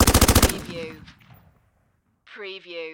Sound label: Machine Gun Steyr Aug